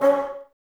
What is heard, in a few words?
Bassoons C#4; Miroslav Vitous - Woodwind Brass Ensemble